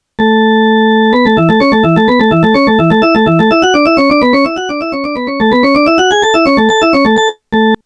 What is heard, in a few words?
drawbar